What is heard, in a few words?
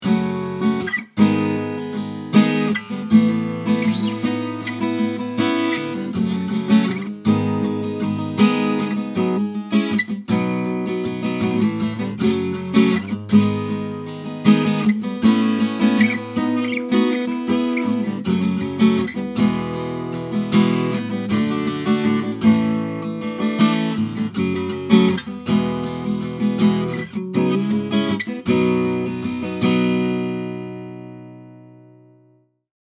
Takamine GN51 Nat Strumming Pattern 1